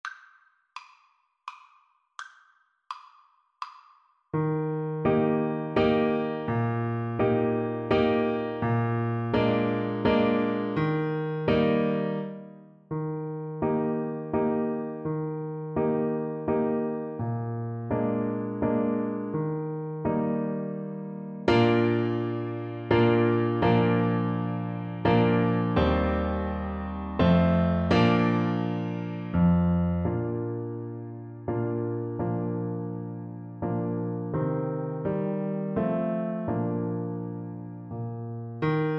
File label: oranges and lemons, Trombone